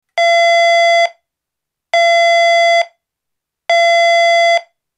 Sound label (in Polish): ton